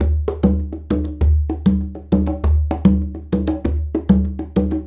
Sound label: Congas; Essential Percussion